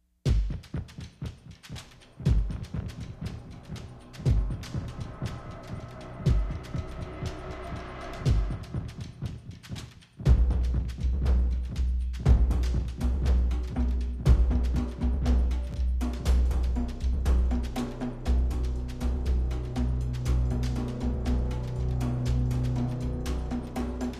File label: Pulsebed